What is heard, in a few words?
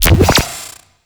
Note Trigger Good Sound; Warframe; Bard Quest; Bard Boss Fight Notes